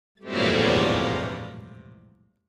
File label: Accordion Bass Keys